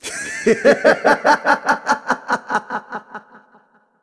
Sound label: Laugh Slow Motion; Ghirahim laughing (slow-motion scene, breaking Impa's force-field); Skyward Sword